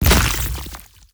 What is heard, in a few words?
sea sword